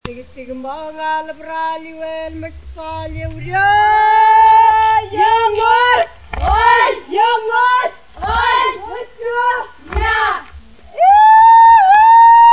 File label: yap singer